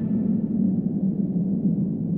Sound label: deep wind; Skies of Arcadia; Looping Sounds